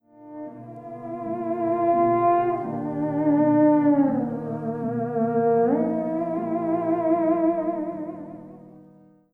theremin

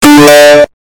ios notification earrape

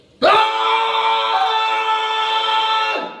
yelling; ben phillips

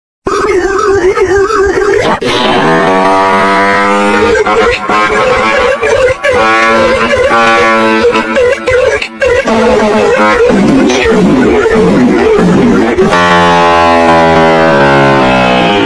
long brain fart slowed loud

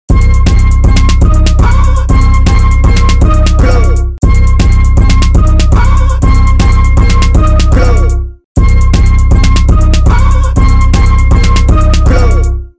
beat

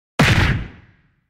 hit

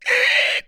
pig squeal

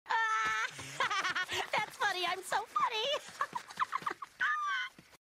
laughing thats funny im so funny; peridot

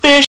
fish sound effect funni

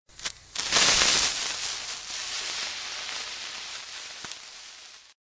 creeper hiss sound; minecraft

